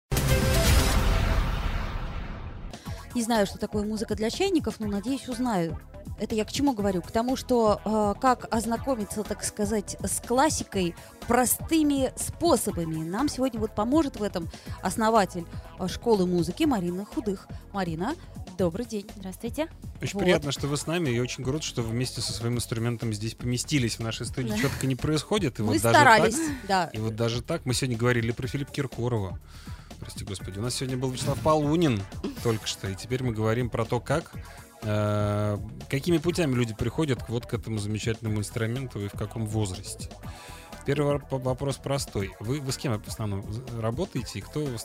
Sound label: office piano